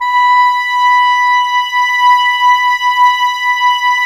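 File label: clarinet; Miroslav Vitous; Woodwind Brass Ensemble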